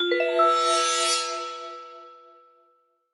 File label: cheshire Cat appear; sfx